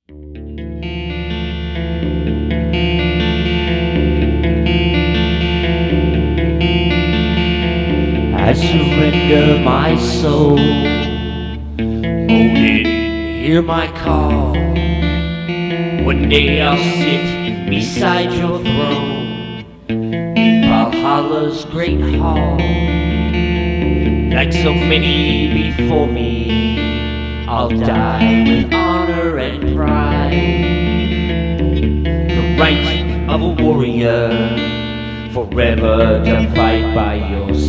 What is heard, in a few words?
swords